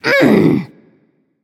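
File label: grunt hit, female; smite; voicepack; hera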